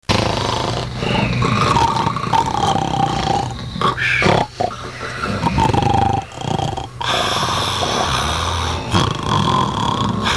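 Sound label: strong snore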